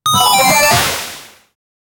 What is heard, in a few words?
multiplier, electric